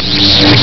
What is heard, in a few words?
ZIP